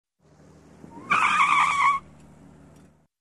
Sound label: Squeaking Tires